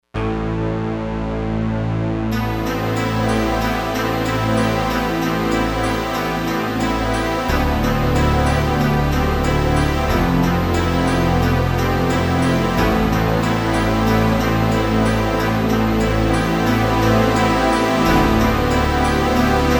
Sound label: Spacepad; Digital Synthesizer